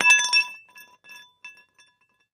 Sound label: Kitchen Glass, kitchenware